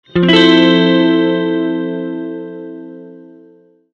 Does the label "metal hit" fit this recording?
No